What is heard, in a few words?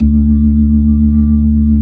B-3 Jazz; Keyboards of The 60's and 70's